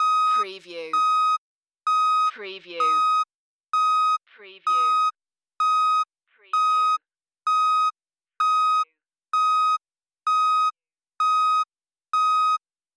Truck Reverse Beep

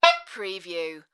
Sax Squeak

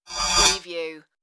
computer interface message

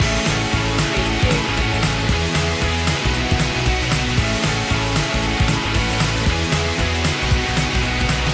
rock me indie loop

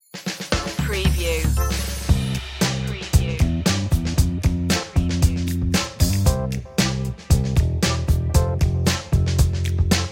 relaxing groove

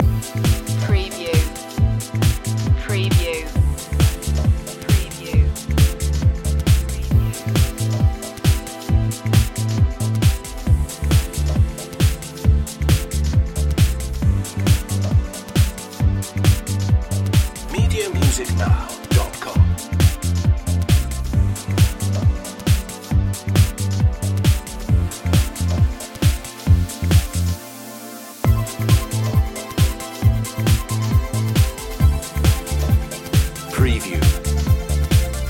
smash house loop

